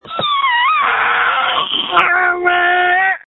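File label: Scream, phone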